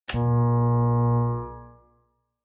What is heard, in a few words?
TV Degauss Long